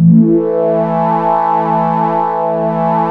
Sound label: JUP.8 C5, JUPITER 8, Rare Synthesizer; Hollywood Edge - Giorgio Moroder Rare Synthesizer Collection